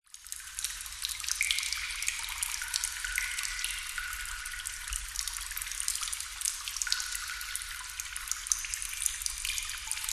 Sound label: nature water drips constant medium large reverb cave or warehouse; zapsplat